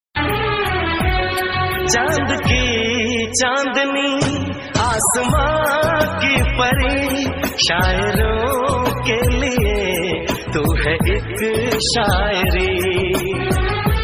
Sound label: old song ringtone hindi hindi song ringtone 90 s song ringtone